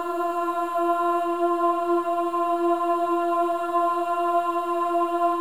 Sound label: WOMEN AHS F4; Spectrasonics; Symphony of Voices